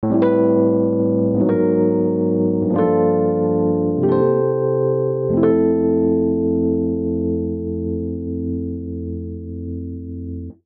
Cadence Sideslip iii - ii - V - I C Major 90 bpm, Electric Keyboards; MIDI Clips; Ableton; Factory Packs